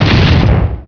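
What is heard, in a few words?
double barrel, shotgun; Doom PSX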